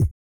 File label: HICCUP; EURO HICCUP; Spectrasonics; Vocal Planet; Blues Soul